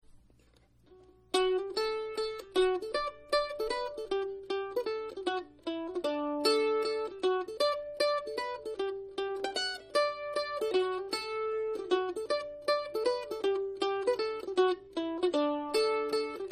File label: sonnys mazurka, irish, banjo